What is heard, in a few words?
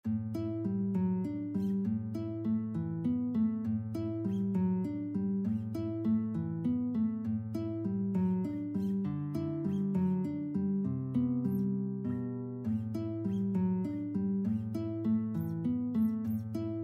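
2 guitars, guitar duet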